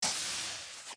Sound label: doors; TNG